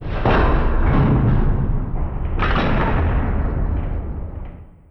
Door Open

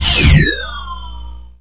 Laser C Sustain

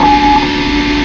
Eject Start

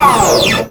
All Coolant

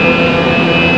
Laser Loaded

